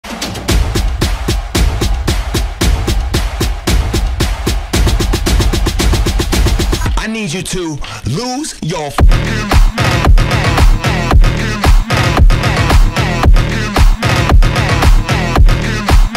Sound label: Ringtone